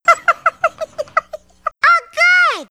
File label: hunter pounce; huggy wuggy snpc; elmo